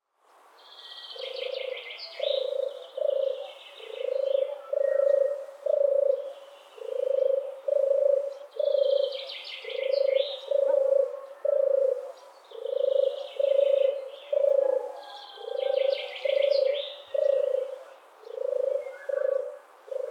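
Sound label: European turtle dove voice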